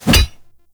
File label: Wolf Axe Catch; Grineer Wolf